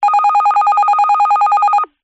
technology cb radio call ring

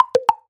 Notification Alert; sound ex machina

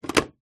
mains plug adapter australia to uk plug in; household

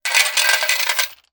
industrial chain med place down on metal surface; zapsplat

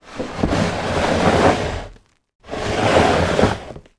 household kitchen sink drag worktop full of water; zapsplat

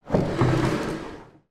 household door slide close

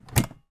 toy plastic oven door open

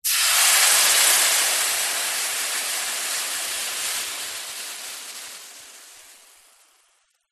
household water sizzle dripped on iron hot

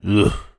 human voice male ugh grunt disgust

